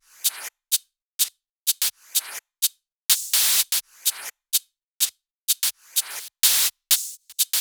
126BPM Kit; Netherworld Kit; Vengeance Samples

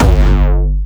Jumpstyle Kick G1, vengeance essential bassdrums; Jumpstyle Multis; vengeance essential club sounds-3; VEC3 Bassdrums

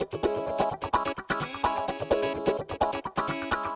Electric Guitar 128BPM C# min; Vengeance Samples; VEH3 Electric Guitar Kit 2

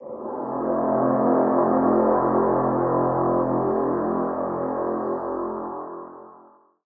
Pad; Dubstep Sample Pack

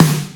snares; Vengeance Samples

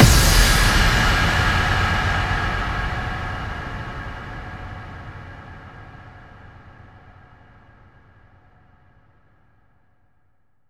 Bassdrums FX, FX Kicks; Vengeance Samples

